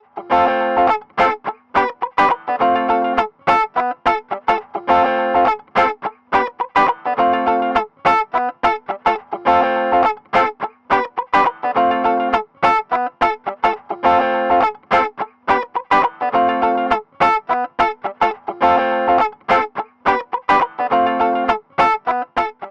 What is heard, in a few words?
Pedal OD Gain0; Ableton